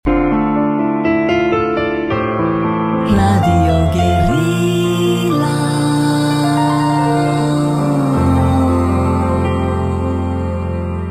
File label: Night Piano; Guerrilla